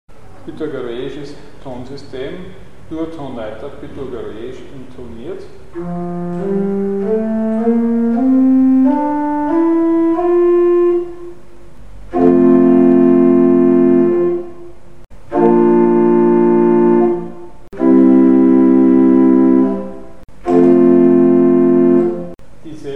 pythagoreisch vs harmonisch; Mikrobuch

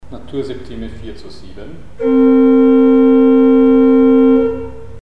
Naturseptime 4zu7 simultan; Mikrobuch

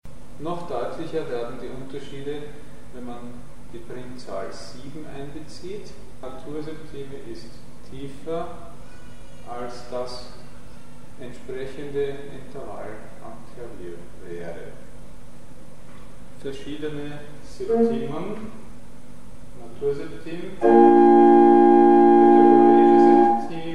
Septimen klein, verschiedene

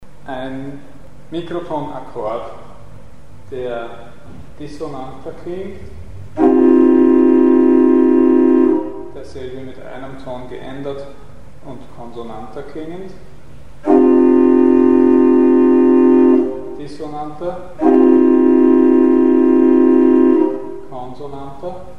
dissonantere und konsonantere Mikrotonakkorde; Mikrobuch

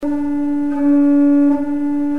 21,5 Cent Intervallabstand; Mikrobuch

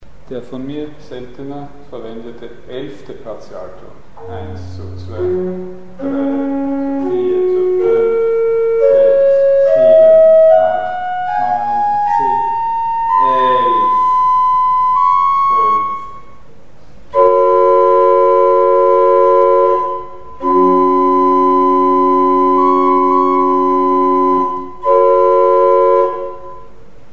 Partialton 11; Mikrobuch

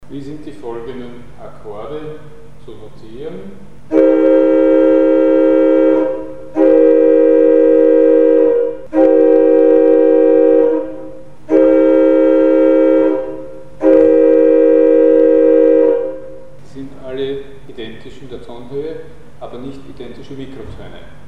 Chromatisch identische Akkorde mit unterschiedlicher Feinstufenintonation; Mikrobuch

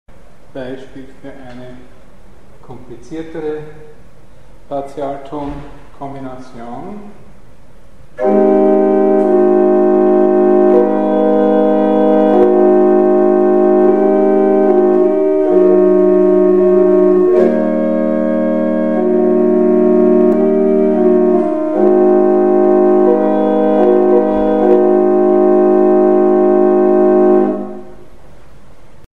komplexere Partialtonkombination; Mikrobuch

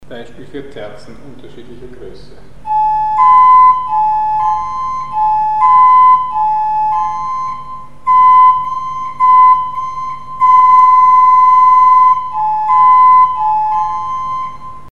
Kleine Frequenzuntwerschiede zwischen verschiedenen Terztoenen; Mikrobuch

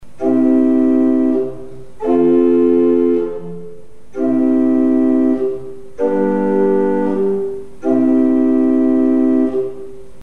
Kadenz reintonal; Mikrobuch

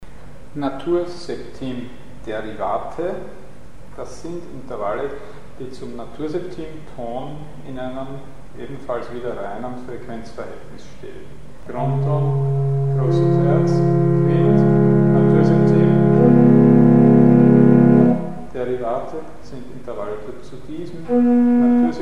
Naturseptim-Derivate; Mikrobuch

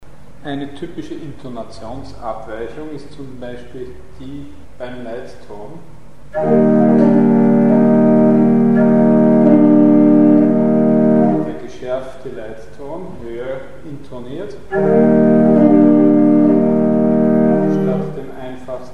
Intonationsabweichungen Leitton; Mikrobuch

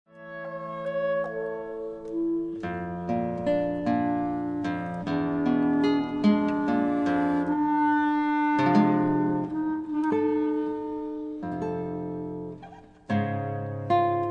Zwischentoene f Klarinette und Gitarre; UD Soyka

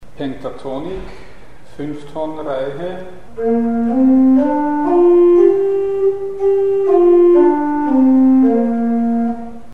Pentatonik; Mikrobuch

